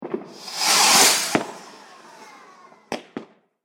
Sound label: Fireworks Rocket Launched With Small Crowd